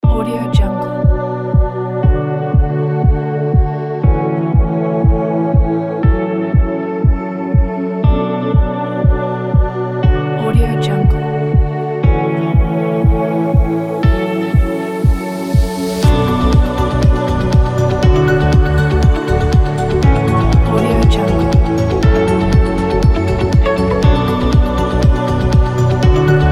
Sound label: inspiring ambient cinematic corporate